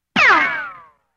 svist puli

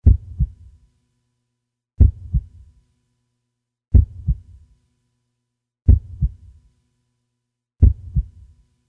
serce ostanavlivaetsa

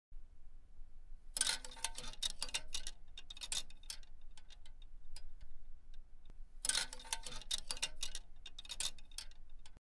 zvuk plechikov veshalki; Звуки куртки, штанов и вещей в шкафу на вешалке

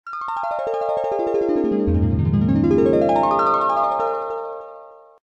zvuk arfy

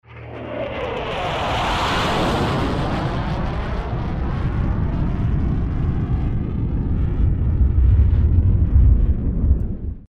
Raketa proletela mimo

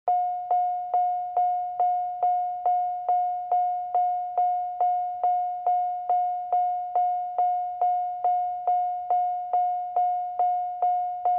Signal ne pristegnutogo remnia avto

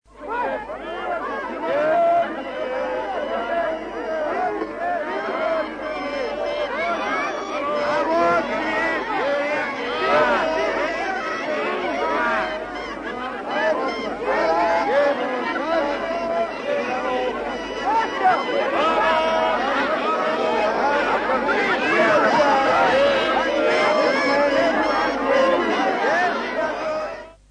rynok

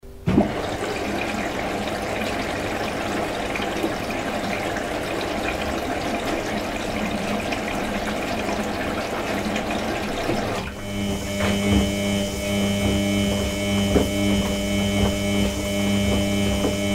zvuk stirki mashynkoy

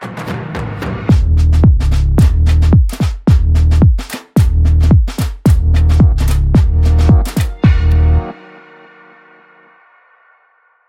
muzykalnyaya otbivka

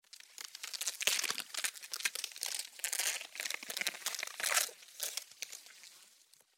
zvuk skeleta

